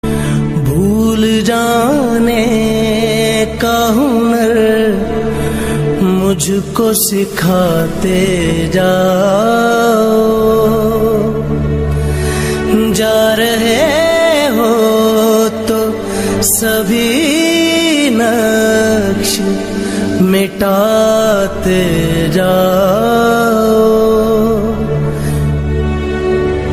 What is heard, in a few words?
love sad